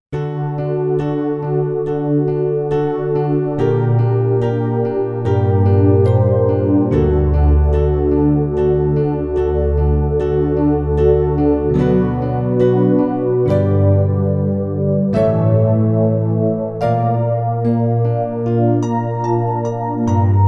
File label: Big Ballad